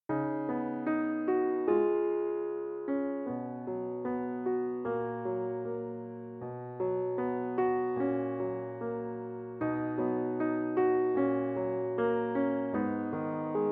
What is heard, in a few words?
Accomp; FOLK